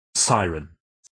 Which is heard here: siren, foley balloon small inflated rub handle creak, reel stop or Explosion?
siren